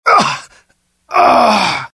death, pain; mohaa; dialogue

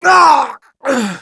death; mohaa; dialogue

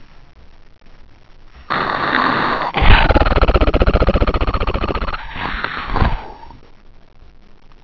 headcrab alert; xash3d fwgs

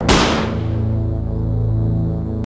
death; singe

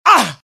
death, pain; mohaa; dialogue

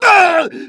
death; mohaa; dialogue

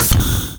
flamer switch; cdogs

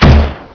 spider grenade; hurrican

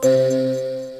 tick; singe; Space Pirates HD

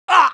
damage, pain; dialogue; mohaa

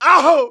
hit, pain; mohaa

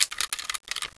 reload; xash3d fwgs; urbicide

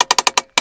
Bomb Priming; items; mohaa

